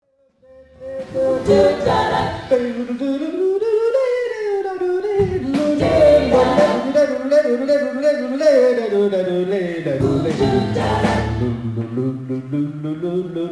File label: dancin vocal solo